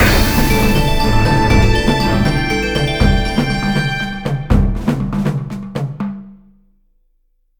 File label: Win; Jason's Quest